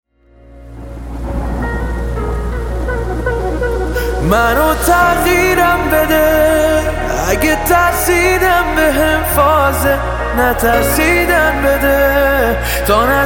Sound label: Piano Version